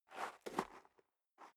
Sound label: step dress boots gravel light scuff; Footsteps; schritte